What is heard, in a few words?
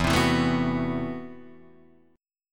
A Minor 6th Add 9th E, Chord